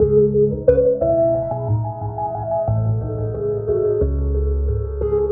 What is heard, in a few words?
Synth Pad Loop